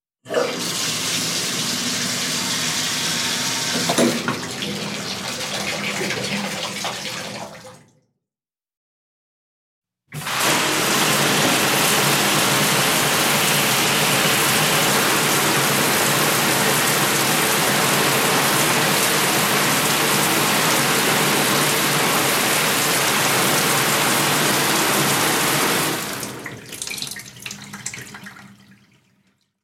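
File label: old shower, bath; zvuk starogo dusha vanna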